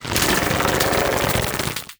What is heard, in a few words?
extra electrics submerge